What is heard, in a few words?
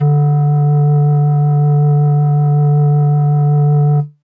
Pan Flute DX100 D2